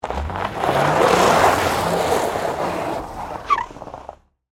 Tires Spin Donut, Transportation, Automobile, Tires, Spin, Donut, In, Snow, Ice, Crack; Saturn, Vue; BlastWave